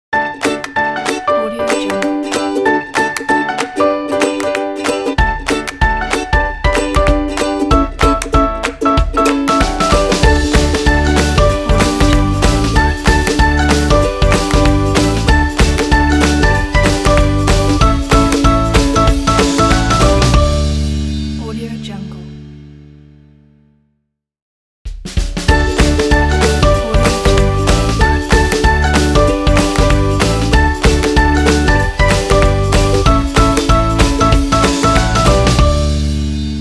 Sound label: upbeat happy kids intro; puremusic